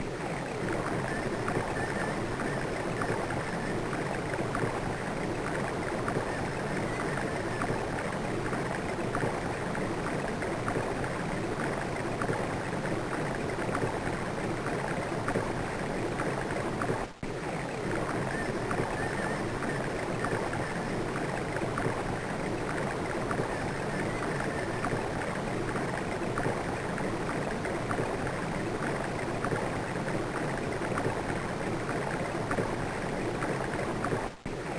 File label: Flowing Water; Mother 1+2; GBA